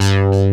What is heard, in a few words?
PEGGIATE; Pro-Rec - Synthsonic; BASSES